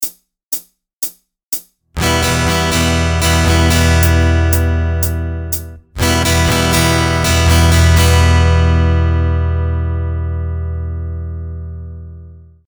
Variations of the Ultimate Strumming Pattern, guitar; Ultimate guide to strumming book